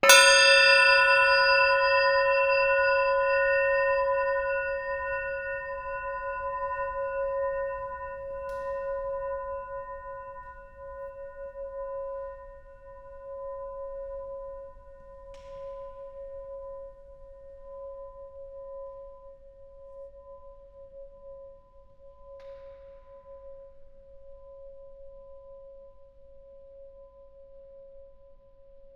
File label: Bell drop gong